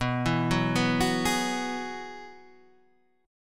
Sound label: G sharp Minor 7th Sharp 5th, chord